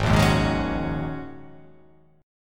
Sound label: Ab 7th Suspended 2nd Suspended 4th Ab down; Ab7sus2sus4 strummed